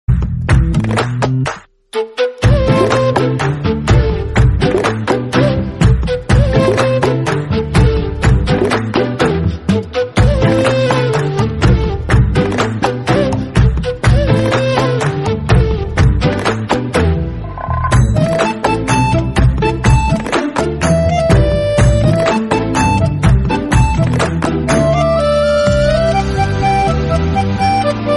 song bgm love bgm

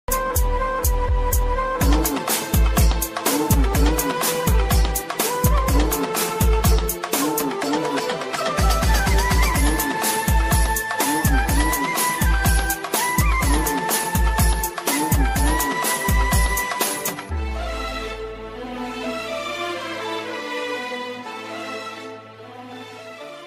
love ringtone remix bgm tamil love ringtone; south ringtone

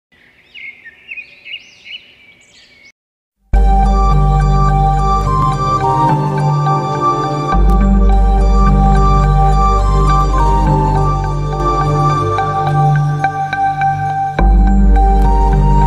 bheema love bgm ringtone background music

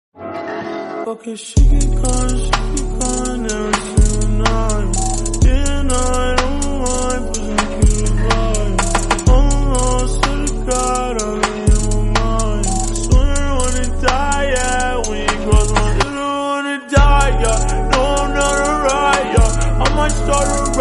slowed reverb, english song ringtone

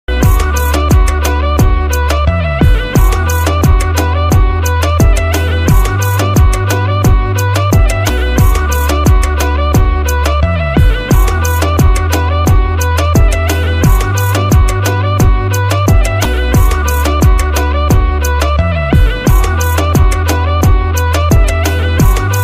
instrumental mobile ringtone

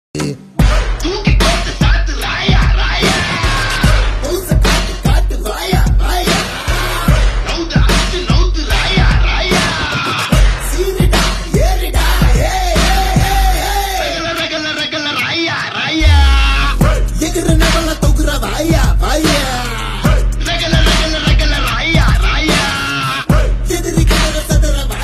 mass bgm; raayan; dhanush

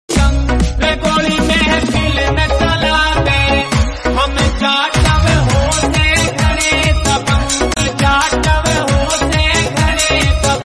dabang ringtone dangerous ringtone

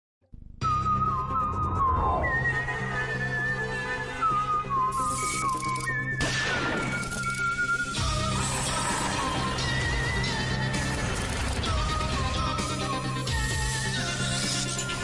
background music

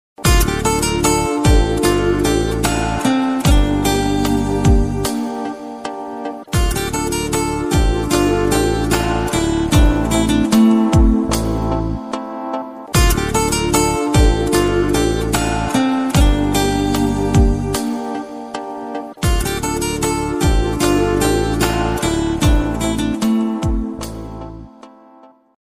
tamil love bgm ringtone love bgm; Singam 3

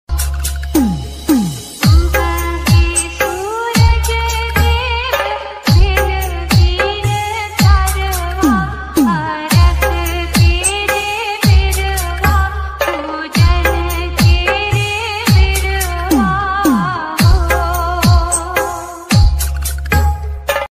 chhath puja ringtone

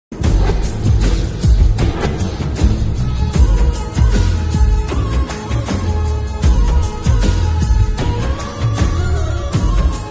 song instrumental; dil se movie

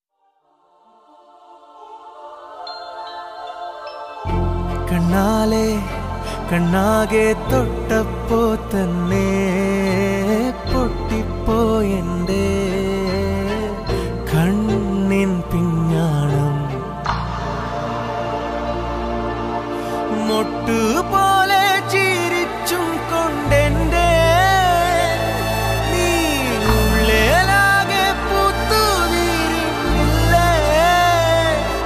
proposal scene bgm, climax love bgm ringtone; Premalu

